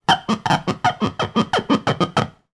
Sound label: foley glass rubs large; zapsplat